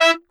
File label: Trumpets; pitchglitch